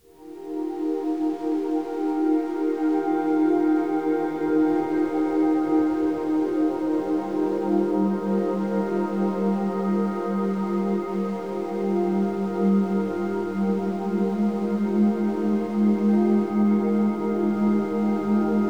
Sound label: Ambient Rain Deep Sleep Music